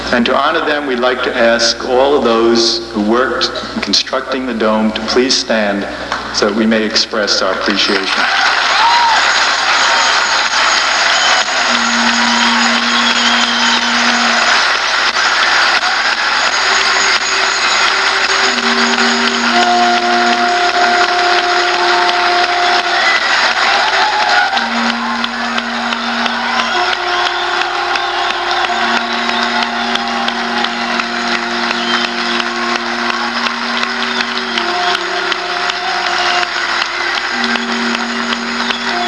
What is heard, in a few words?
applause